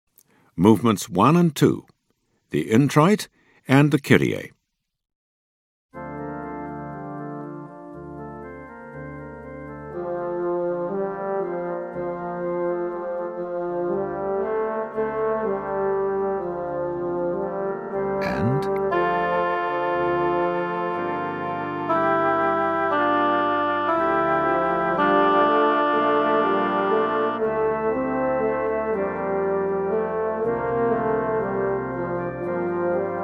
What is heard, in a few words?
Introit 2nd Alto